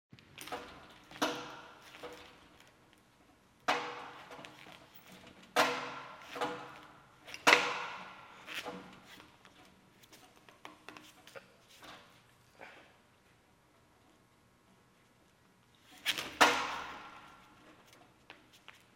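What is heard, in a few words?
gymnastics parallel bars exercise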